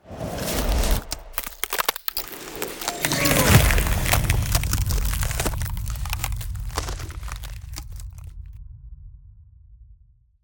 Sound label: Ice Spell Freezing Slowing Frost Web; Arctic Aura